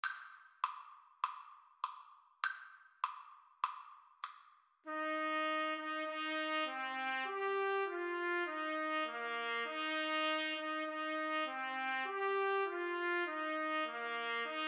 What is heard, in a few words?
trumpet duet